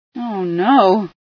startle, female

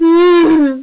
uhhhh

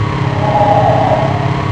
seeker; sven; lowell

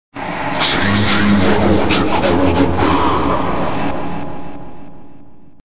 change cold; keencity

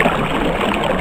water; sven; digilab

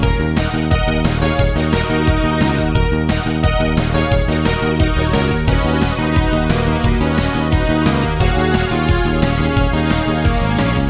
Turrican 16bit

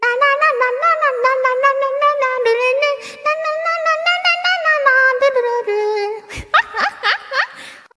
lalala

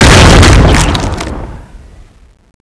gauss, weapons

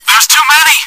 run

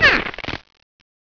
headbite, dragon

